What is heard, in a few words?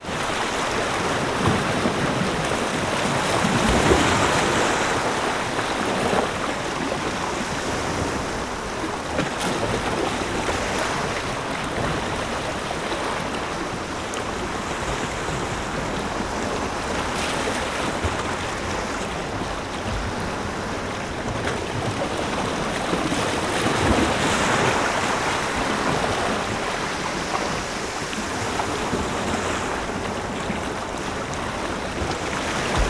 water ocean waves rocks light; sound effects one